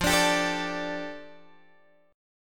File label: F 6th Add 9th F Guitar Open G